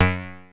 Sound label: OB F#3 -R, Rare Synthesizer; Hollywood Edge - Giorgio Moroder Rare Synthesizer Collection; OB 4 VOX.